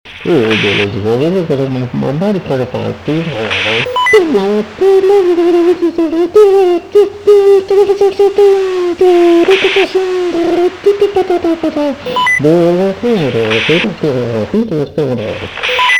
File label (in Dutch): Portofoon